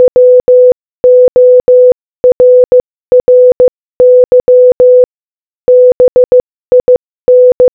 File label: WORRY BIN Morse Code Audio